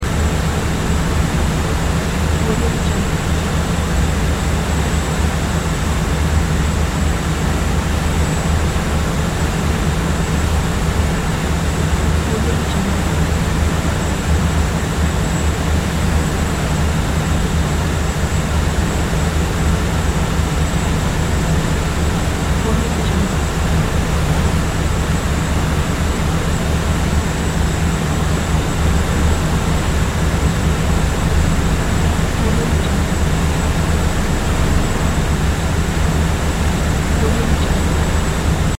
large passenger jet idling

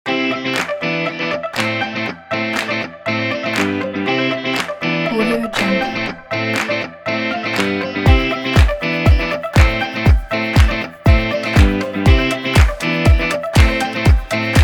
upbeat pop

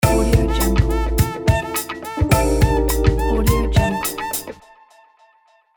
soft jazzy logo

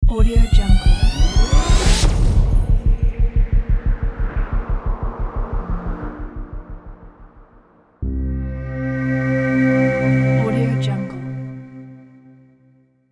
scifi tech logo

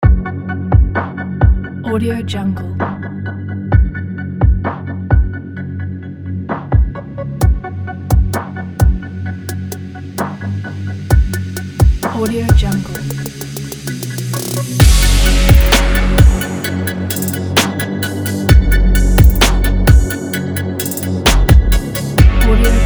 heavy industry trap beat

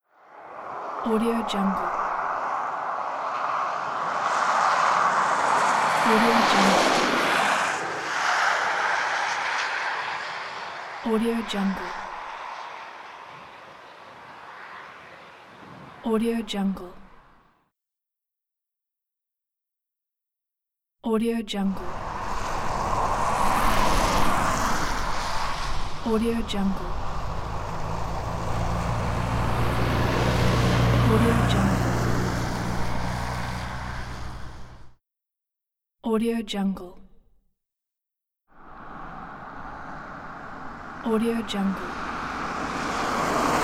car passing by on a wet road pack; tonwagensfxlab

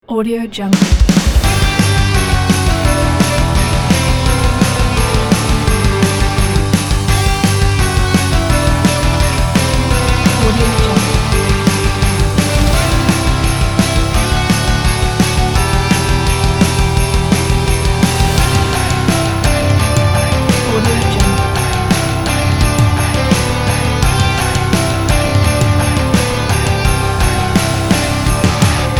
rock this christmas

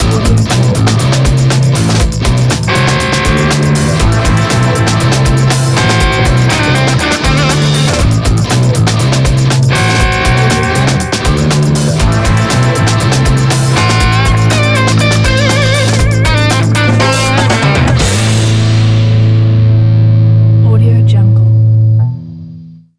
dark spaghetti twang ident

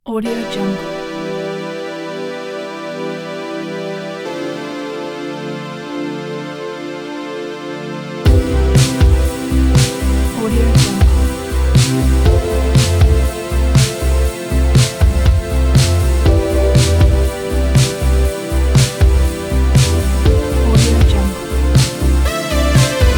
driving indie pop

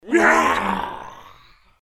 vampire attack; fxprosound

